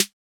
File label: SD, Yamaha DJX 2B